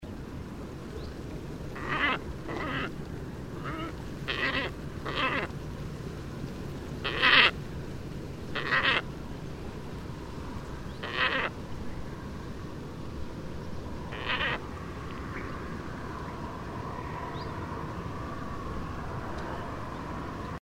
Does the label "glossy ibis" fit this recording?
Yes